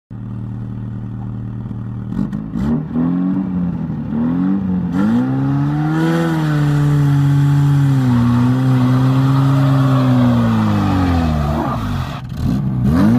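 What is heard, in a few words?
VAZ wheel slip; vaz probuksovka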